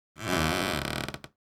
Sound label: creaking floor